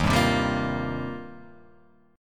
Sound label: D sharp 6th Add 9th, D sharp, down; strummed; JGuitar